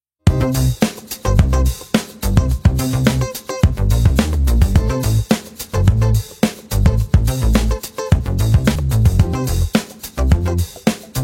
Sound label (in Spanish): Musica de fondo